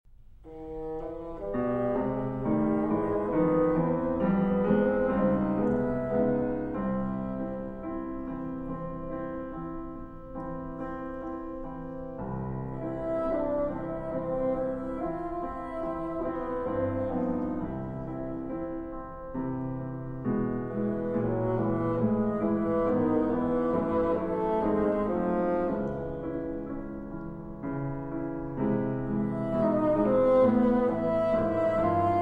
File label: Bassoon Sonata